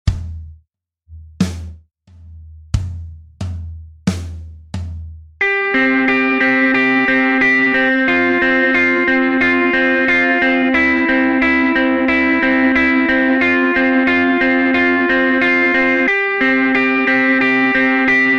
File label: Guitar backing